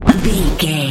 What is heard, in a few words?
sci-fi shield power deflect block